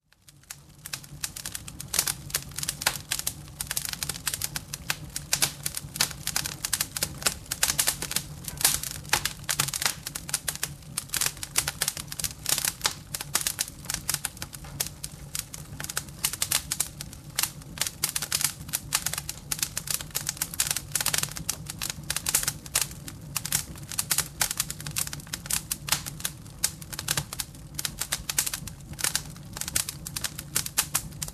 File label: Bonfire crackling